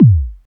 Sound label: Bassdrum, Roland System-100; Drum Samples